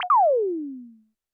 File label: percussion, Roland TD-20